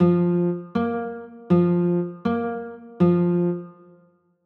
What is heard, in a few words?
b flat 9, chords